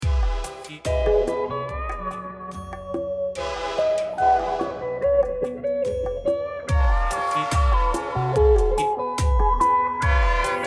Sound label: slo funk train